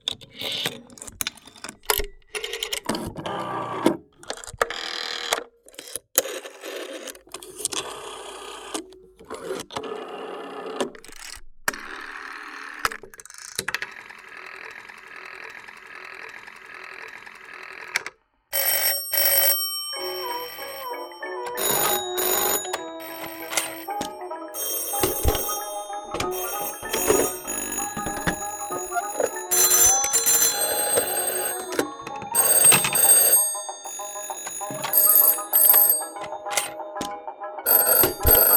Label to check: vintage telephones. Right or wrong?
Right